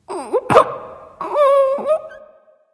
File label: boo hoo; smite; voicepack